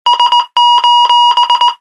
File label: Sos Tone